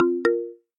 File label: new message